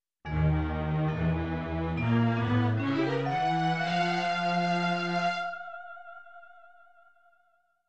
Vintage horror orchestra increasing tension climax